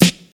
sharp acoustic snare sound f key, snare drum sound tuned to the F note; snare drum samples